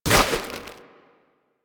Fishing Spear Charge; Warframe; Infested Fishing Spear

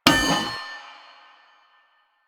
Street Sign Destroy; Warframe; Ambience; Nineteen Ninety Nine

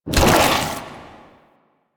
Destruct End; Warframe; Broken Frame

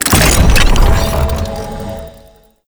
Duviri Hack Minigame UI Start

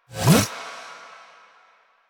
Bullet Jump Pre Land, Motorcycle; Motorcycle Ninety Nine

